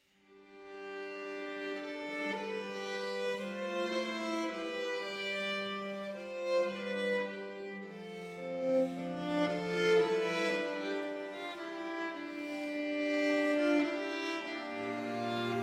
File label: Kyrie I (Arr. For Strings); Missa L'Homme Armé; Dufay; Arr. Montanari